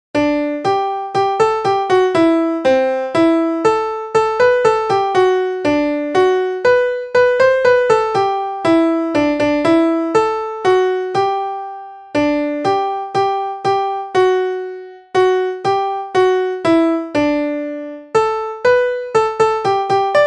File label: we wish you a merry christmas melody